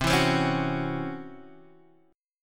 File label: C sharp Minor Major 7th Double Flat 5th C, guitar chord